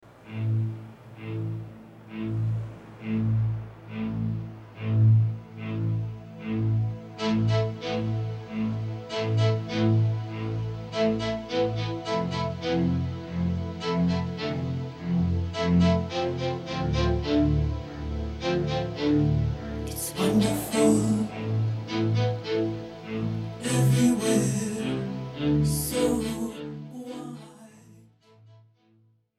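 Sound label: mellotron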